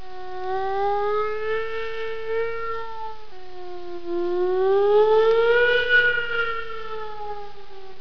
emergency

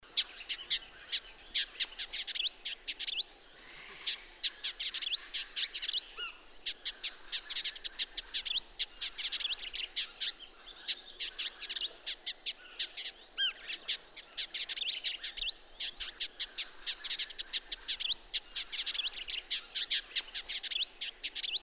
village indigo

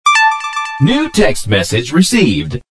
new text message received

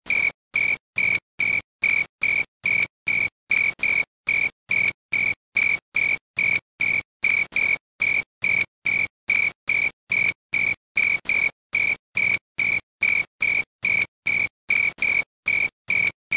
crystal cricket

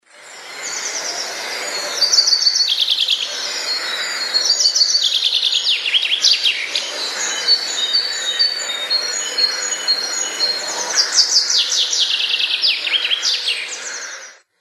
chaffinch

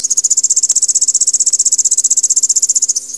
bird flicker

